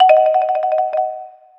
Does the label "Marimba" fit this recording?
Yes